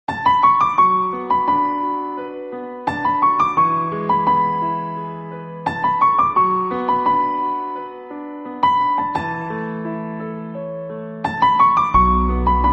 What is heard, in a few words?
bollywood soft music, ringtone